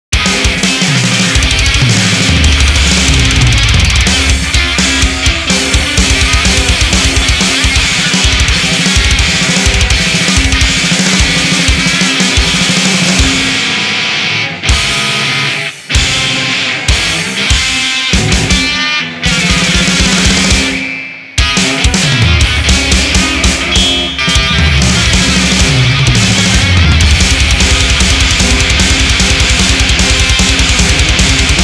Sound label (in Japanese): ギターとドラムのみ